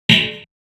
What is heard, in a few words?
Snares, Alesis Performance-Pad